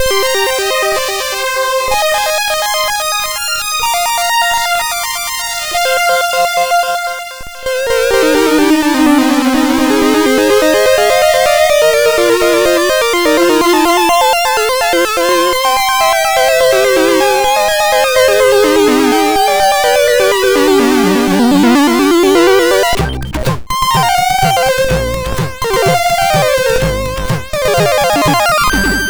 Echorama, MOS6581R4

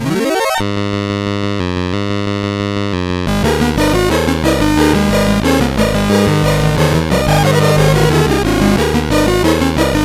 Battle Medley, sid CSG8580R5; Final Fantasy

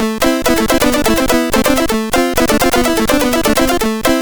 Crazy Intro, CSG8580R5

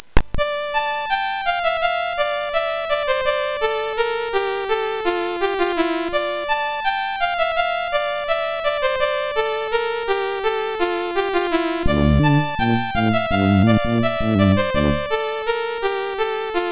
Casio, MOS6581R4; hvsc

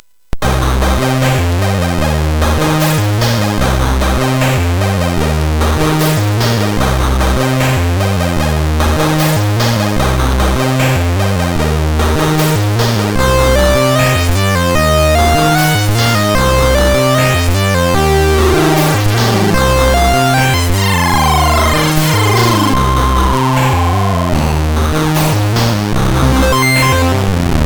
sid MOS6581R4, GAMES; Dalek Attack

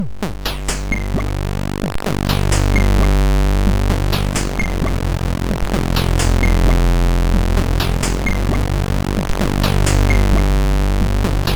Rythmic Sound, MOS6581R2

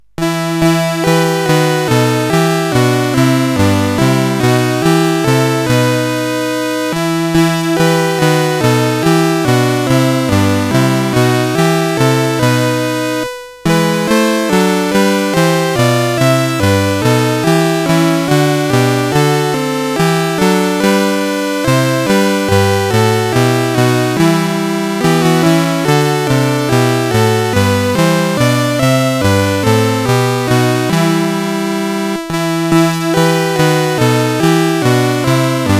God Rest You, CSG8580R5

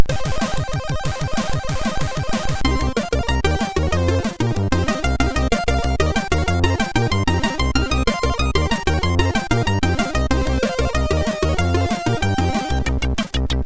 Acid Remix, MOS6581R2; Acid Runner Remix

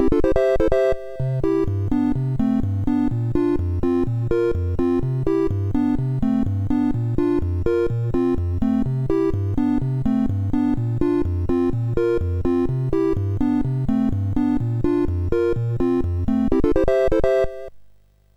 tune sid MOS6581R3

